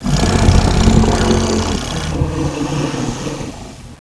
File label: monsters idle; oblivion darkangels